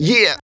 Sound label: Beatbox; neuro; Sinistarr